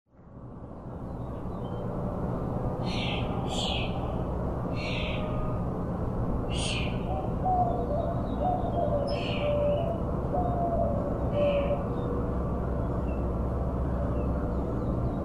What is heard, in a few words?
Scottsdale Birds